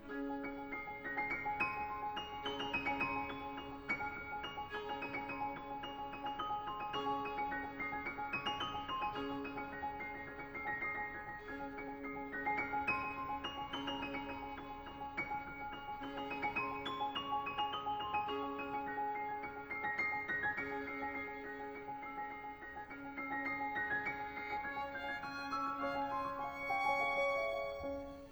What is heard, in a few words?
Piano Trio in A minor, Andante con moto